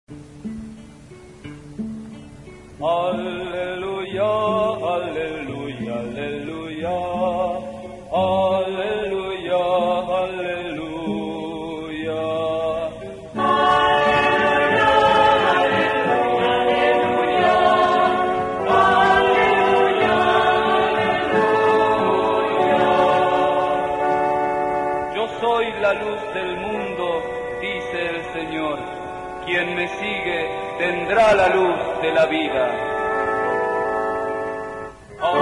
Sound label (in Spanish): Aleluia; Taize